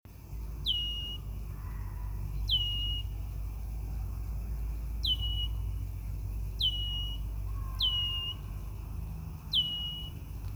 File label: Harris's Hawk, Parabuteo unicinctus; Aves; iSoundNat; inaturalist